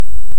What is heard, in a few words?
Hydrophone; OneOcean